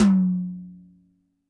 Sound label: TD-20 Tom B, Roland TD-20 Toms